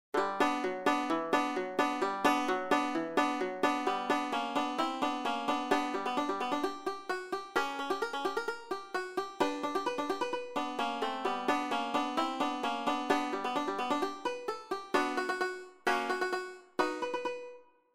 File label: A Banjo Vamp